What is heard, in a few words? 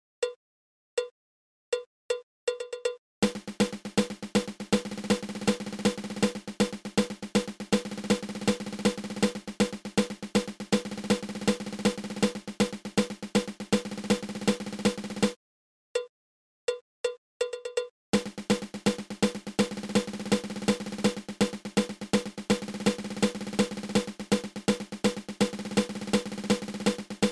Five Stroke Roll Triple